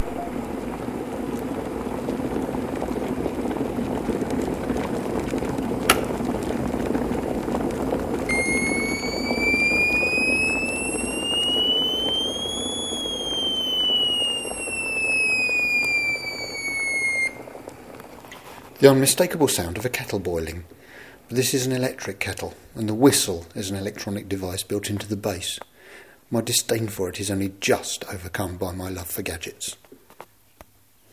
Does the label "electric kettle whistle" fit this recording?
Yes